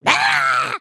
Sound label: Air Fall; creatures organic; Lego; Strombie